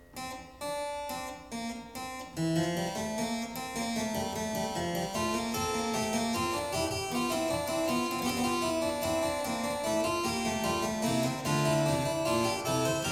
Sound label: Fugue in F major, clavier; Prelude & Fugue No.11; BWV 856; BACH; WELL TEMPERED CLAVIER; BOOK I